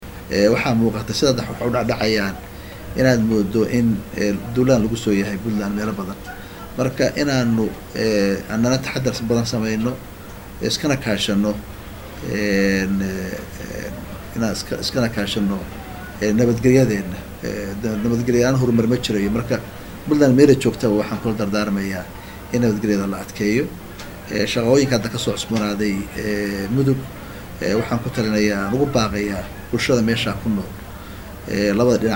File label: Sax